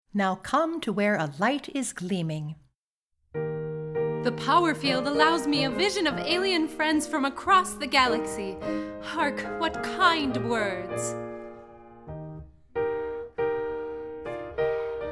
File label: piano